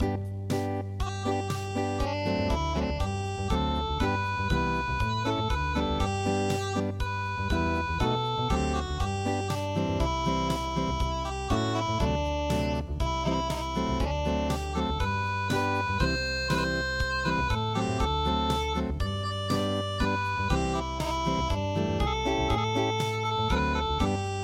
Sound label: unpluged